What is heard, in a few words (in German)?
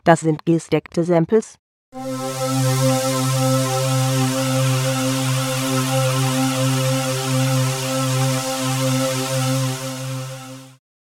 Das sind gestackte Samples